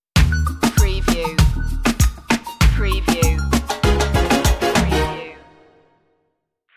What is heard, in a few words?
la chica stinger